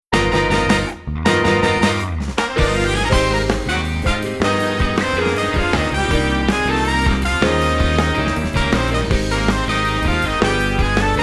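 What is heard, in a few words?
Horn Melody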